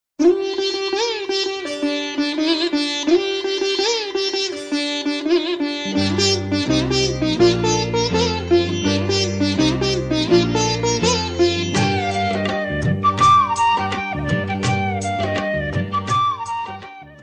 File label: Classical